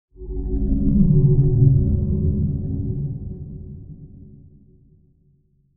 whoomp, synth; ambient; Portal 2

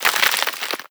debris dirt, bullet impact debris

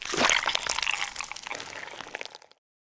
squeeze, headcrab gland; creatures; headcrab classic

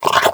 breath in, headcrab

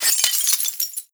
debris glass, bullet impact debris

